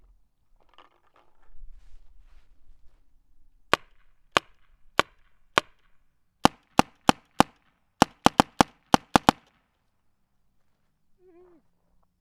spyder long barrel front, guns

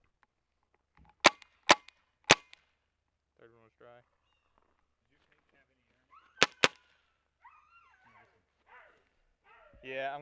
stingray slight clip maybe raw, guns

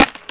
empty shotgun; trrac empty shotgun tweak vol norm short; guns; trracer

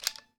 carbine cock, guns; 68 carbine

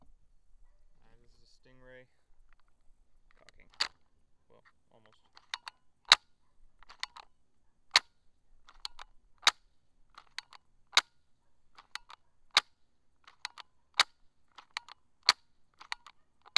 stingray cock raw, guns

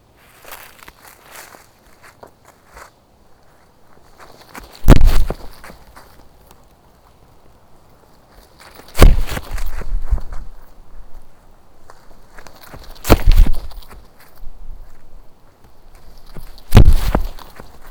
footsteps grass

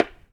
splat metal thin dirt; splats; splat metal thin dirt normalize

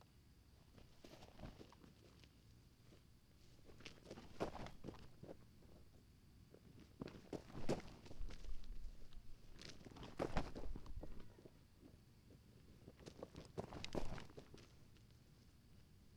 footsteps dirt

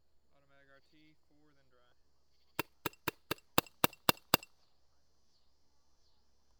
automag raw quiet, guns; automag rt

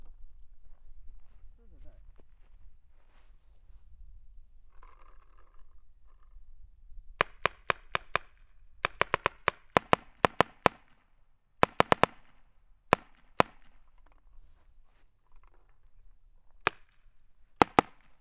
spyder long barrel front, guns; spyder se

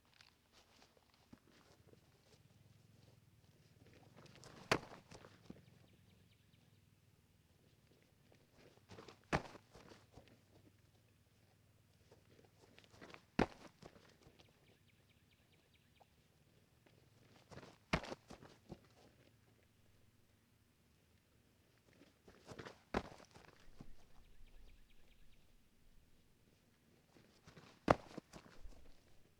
footsteps dirt; short barrel short drop

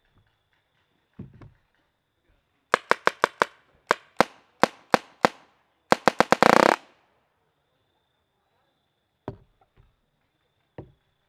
spyder even further, guns; spyder se

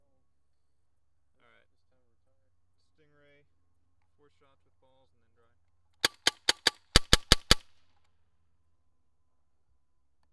stingray raw slight clip, guns

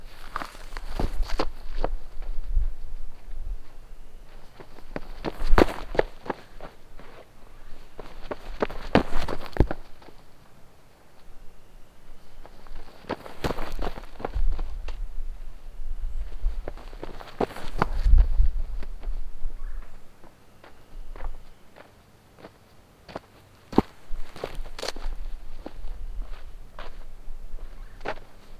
footsteps dirt

